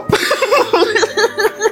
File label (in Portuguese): risada